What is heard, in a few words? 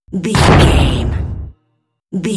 cinematic drum hit trailer